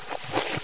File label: ironsights on